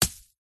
foley hard solid object fall hit ground dry grass leaves thud